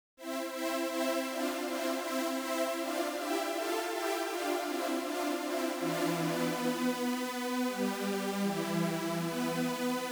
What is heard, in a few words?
chorus pro